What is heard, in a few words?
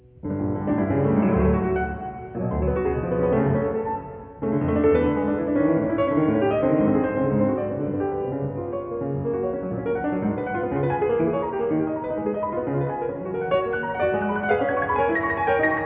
Chopin, amplified mono; 8000 Hz; 16b Win-PCM